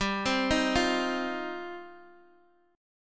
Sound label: G 7th Suspended 4th Sharp 5th G down Guitar DADGAD